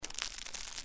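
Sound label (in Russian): звук шелестящей обёртки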